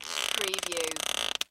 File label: Squeak Creak Stress